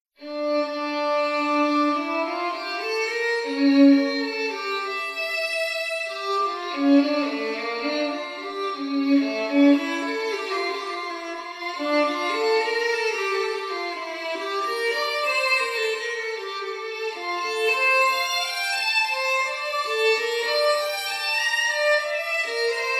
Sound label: violin; Bach